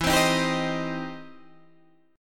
E Minor Major 7th Sharp 5th, E, down, Guitar, Open D